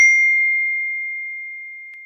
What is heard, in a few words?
iphone sound message